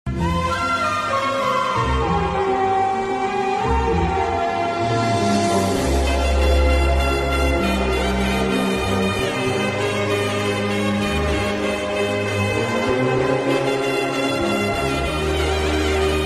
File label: tamil love ringtone bgm